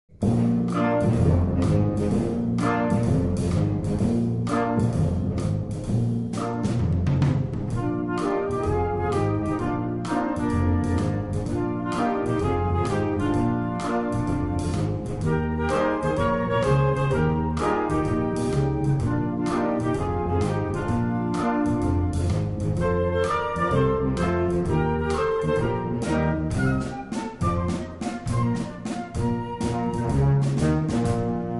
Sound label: cellphone suite